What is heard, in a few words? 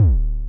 kick, drums single hits; iElectribe Kicks